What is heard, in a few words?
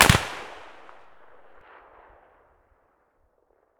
mosin outdoor far; Escape from Tarkov